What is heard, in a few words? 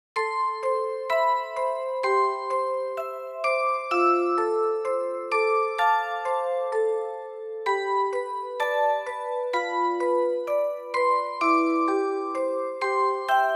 Rain (ORGEL); ookami kakushi